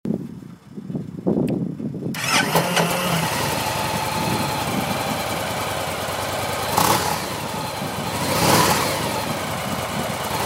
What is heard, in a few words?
victory